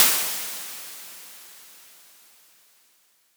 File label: Crashes; LIMAT Div Dept; Drums